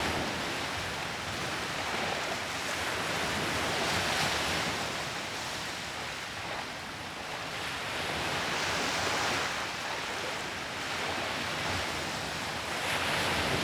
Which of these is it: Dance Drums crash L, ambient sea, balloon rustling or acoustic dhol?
ambient sea